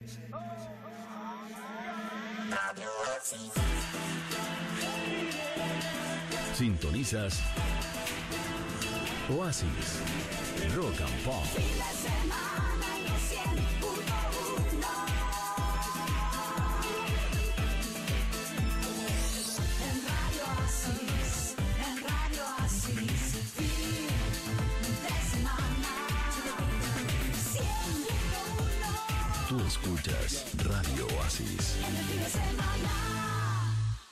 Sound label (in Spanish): jingle fin de semana; oasis 2016